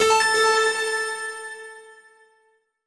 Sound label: Home Menu Banner; BoxBoy; Nintendo 3DS